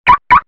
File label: vehicle sound alarm